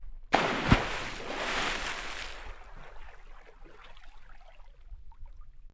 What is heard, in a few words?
Water; TOOLKIT vol.2